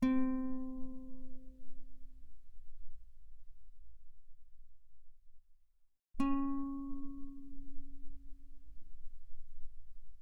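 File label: Guitar pianissimo sul G C4 B4; mono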